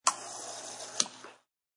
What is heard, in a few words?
Soda gun turned on and off.; Soda Guns; SFXsource